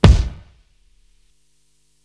Kick, Psychedelic Drums

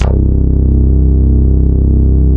BASSIC, JV-1080; SMALL-POP; Syntec; Wall of Sounds

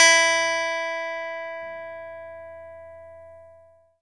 E-GITARRE, Alpine Volksmusik; Best Service

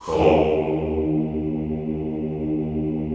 HOUUU, Classical Choir

